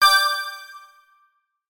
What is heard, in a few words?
connected